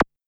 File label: Rimshot, Korg MS-20 Drums